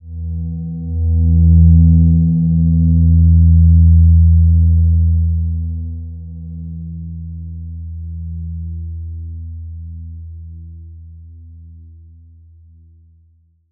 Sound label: Silver Gem E2 f; healing soundscapes